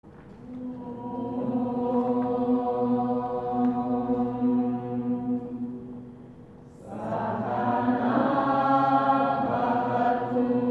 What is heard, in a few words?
Shanti Mantra